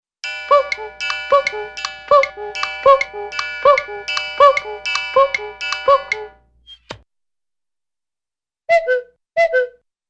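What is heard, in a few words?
Cuckoo Clock with ticks & Small Gong with cuckoo Bird Coo-coo, cartoon; Hollywood Edge; Cartoon Trax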